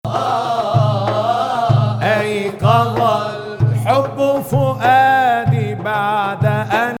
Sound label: Ajam Octave